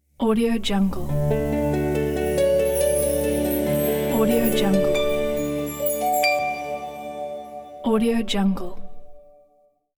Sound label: bright logo